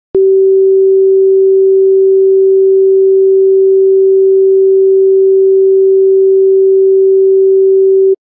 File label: rescue tones